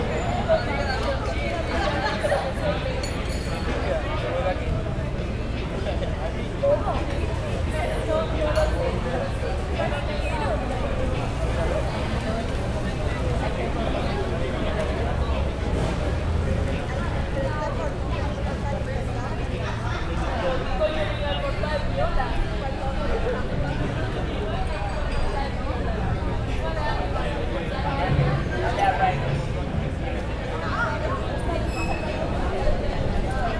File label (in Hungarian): varoshaza kozepiskolasok